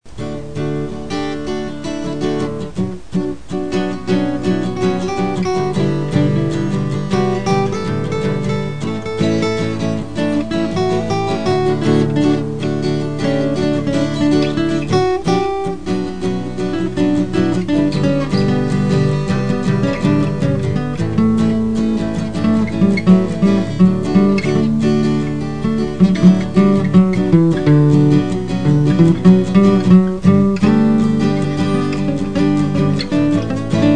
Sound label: Guitar Solo Riff; Solo in D